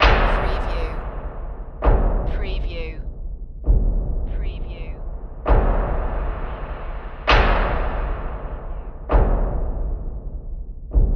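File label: Production Element Bed Hits; Sound Effects Bible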